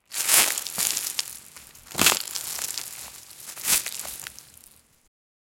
a large creature stomping, leaves

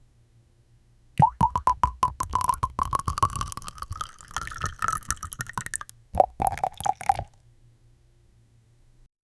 sound of pouring lotion; liquid flow

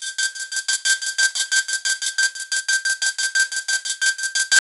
i want a fast forward

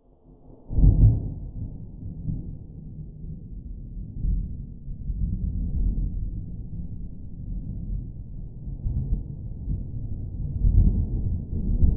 very faraway thunder

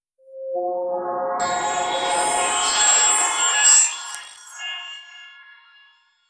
magical magic sounds

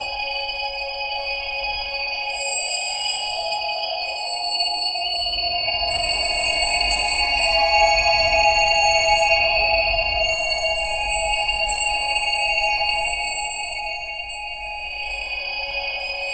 ancient medieval forest l

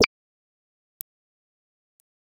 a short crisp modern ui, button

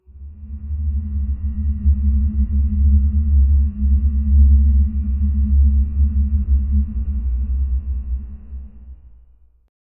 low rumbling hum ambient

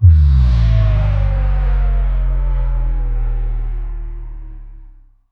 atmosphere

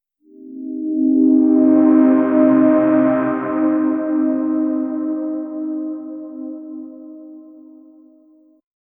podcast intro sound dark

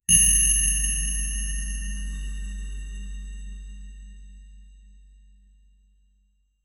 ding sound, rewards

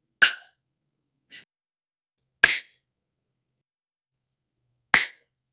slapping on belly soft, fleshy

